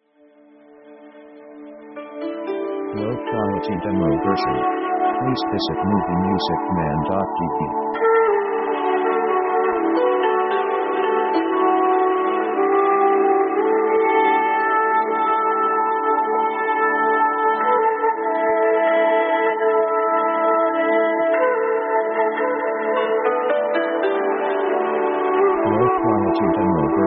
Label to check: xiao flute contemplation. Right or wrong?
Right